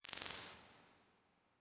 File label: Title Transition Crystal Shatter; Production Element Title Transition